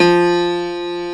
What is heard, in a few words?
PIANO F2; AKAI S-Series CD-ROM Sound Library VOL-2